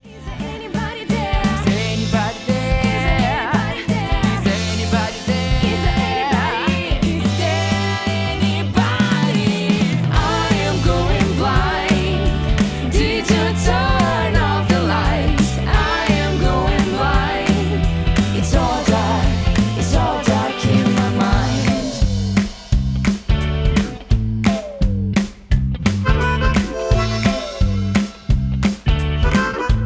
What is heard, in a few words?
Rock Pop